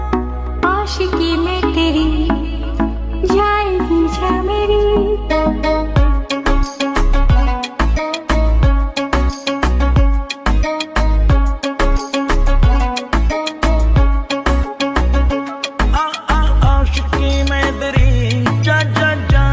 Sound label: bollywood ringtone